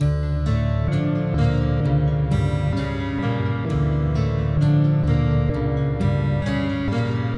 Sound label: cinematic 12 string Gothic Dm 130bpm